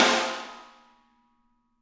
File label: Snare Crack; apulSoft apTrigga2; Steven Slate Drums